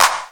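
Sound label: CRACKED CLOX, Techno Trance; Dance Set 1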